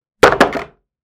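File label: tipping over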